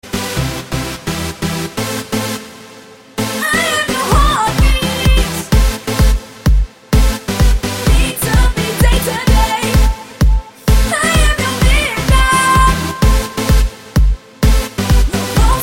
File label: Heart Beat